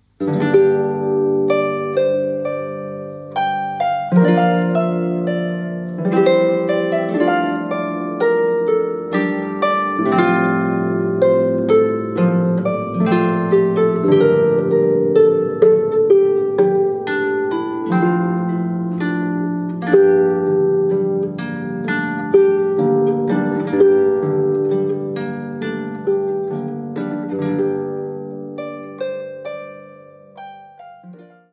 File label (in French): harpe; lecreuxdesages